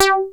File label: BASS G4; Q Up Arts; Dream Experience